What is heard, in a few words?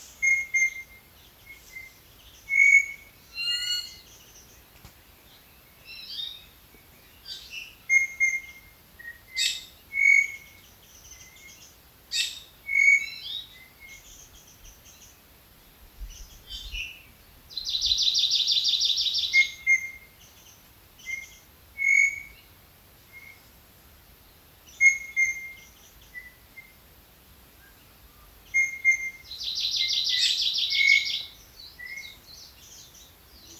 Boundary Trail Birds; Poli Poli